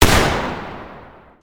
reset; CosmicRage; CosmicRageSounds

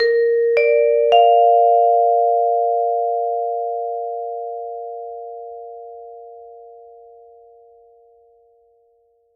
tannoy, factory; CosmicRage

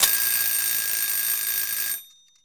timer; CosmicRage

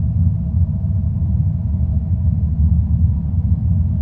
airlock, ships rooms

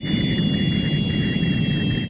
deceleration; Cosmic Rage; ships movement

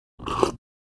liquid, food and drink

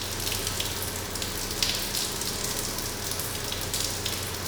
old mining tunnel, ambiances; CosmicRage

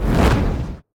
fire, combat, abilities, big claw

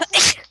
sneeze

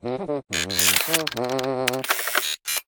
drone reloading, ships combat

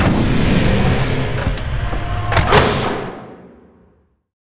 taxi open

